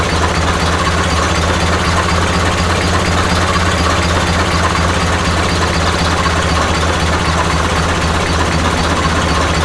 cruise, tractor; vehicles; tdmcars; gtav

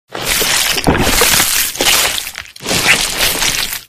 human flood morph arrival

